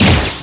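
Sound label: Battle Bow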